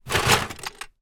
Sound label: toy box full of toys bash sharp movement